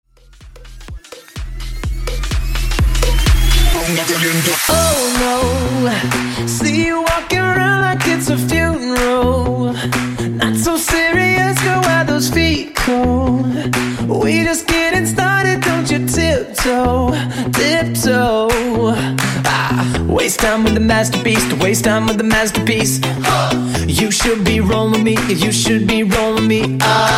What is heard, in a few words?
Bass House